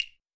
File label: button